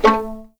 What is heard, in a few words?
A3 PIZ MF, string; East West; The Ultimate String Collection